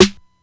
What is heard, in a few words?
SNARE; DIE